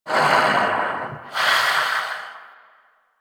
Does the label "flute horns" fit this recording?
No